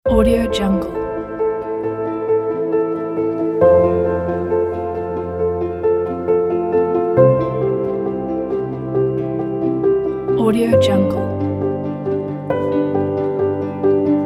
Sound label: inspiring piano and strings; francescoberta